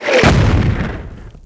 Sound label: mortar explosion; Rabbit-Wars